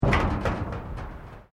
SIGN METAL WIND MOVEMENT, sign blowing in the wind; Materials